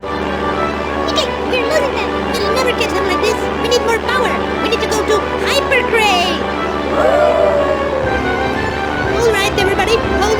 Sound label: Hypercrate! Woooo SFX; Serious Sam